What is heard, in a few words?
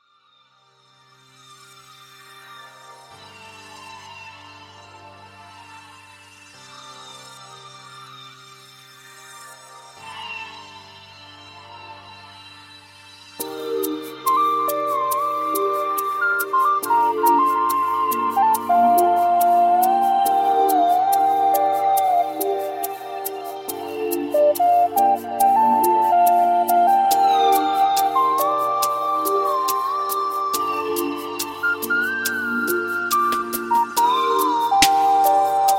Jazz